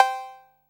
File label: 808 COW, TR-808